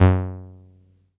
505, String Machines

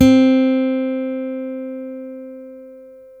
STEEL, Guitars; Spectrasonics; Hans Zimmer Guitars VOL-1; PARKER STEEL

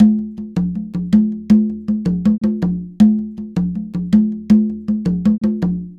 Conga, Latin Percussion

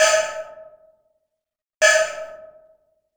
RUN BELL

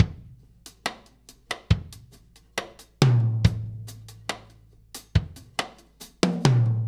Earth Loop, Drum Tools, Laid Back; Sonic Foundry (Sony Creative Software); Loops & Samples Drum Tools; Gone to Earth

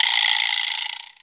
PERC, PERCS KIT

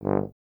BRS TUBA, Solo Instruments

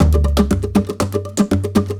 120 PERC

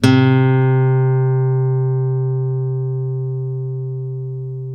ACUSTC C 2, ACOUSTIC guitar; East West; Steve Stevens Guitar Samples Collection; ACOUSTIC PCK

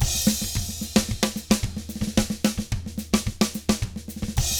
SMBA, 110 SAMBA, Drums; ILIO; Double Platinum Drums 1

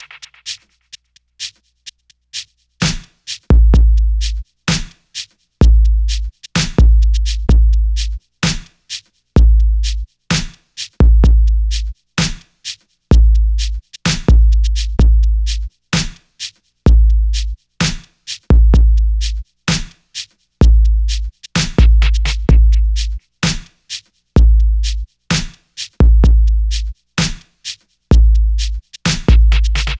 drums D, Smooth RnB, Gmin

